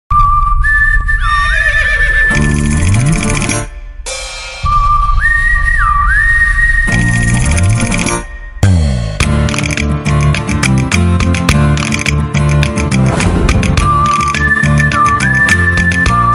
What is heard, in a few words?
south ringtone instrumental ringtone; gabbar singh